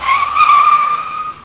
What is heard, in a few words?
skid; Cars; tractor tip